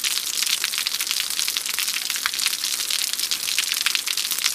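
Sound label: waterfall bottom; Titanfall 2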